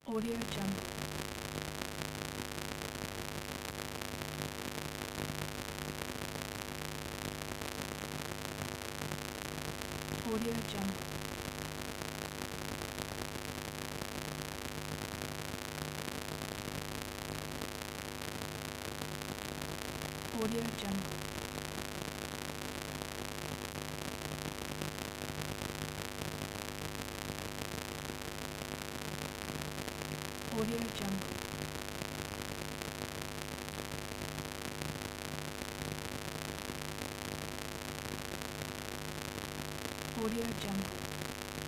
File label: the electric hum